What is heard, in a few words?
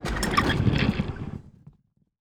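siege initial movement; Onager, Ballista, Ram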